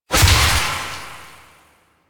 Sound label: Projectile Death Medium; Weapons; Warframe; Duviri; Incarnon Adapters